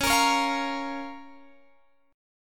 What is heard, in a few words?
Db 6th, Guitar, Open G